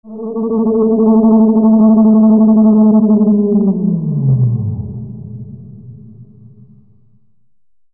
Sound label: SCIFI